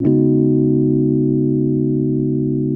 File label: RHODES; Houseworx